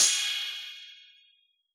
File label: Analog Cymbal, Yamaha DJX